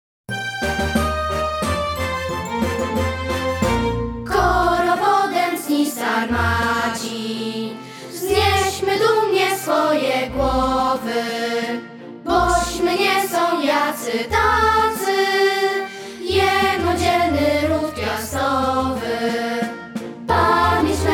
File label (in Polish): spiew